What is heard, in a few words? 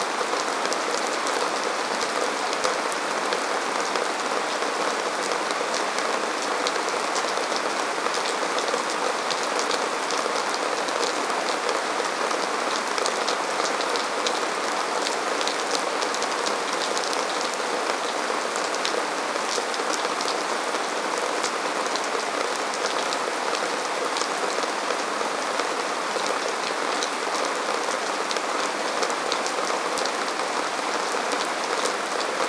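ambience rain medium outside forest under Wood; Aragami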